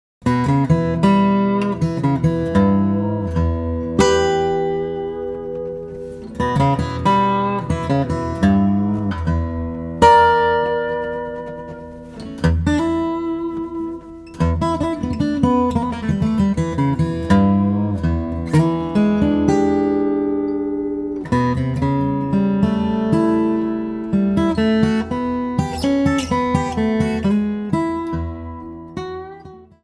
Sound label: Blues